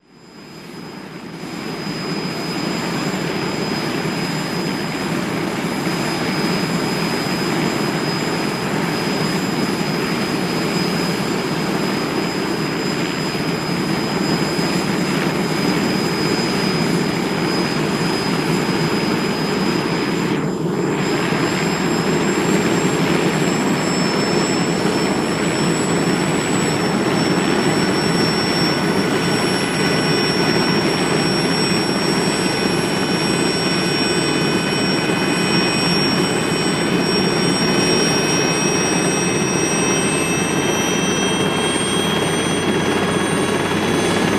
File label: Jet Heli Ext Idl Take O, jet ranger